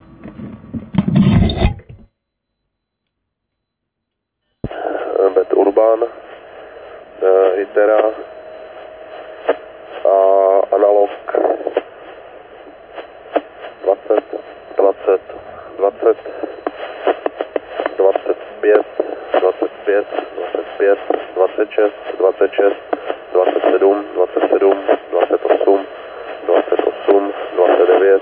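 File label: 5km Bad Urban analog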